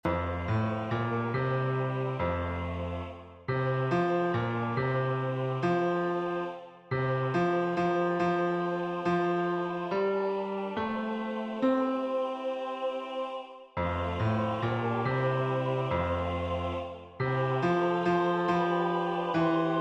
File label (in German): Hoeruebung Bass